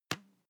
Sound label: floss twitch, Bathroom; haus-garten; badezimmer